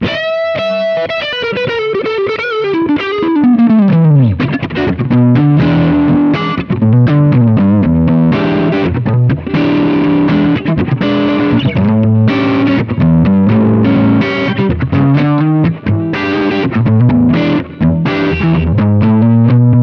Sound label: funk, pod2; tuhnufunk; lp